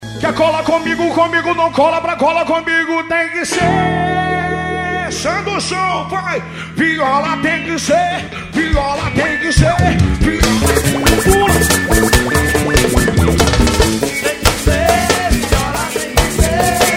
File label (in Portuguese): viola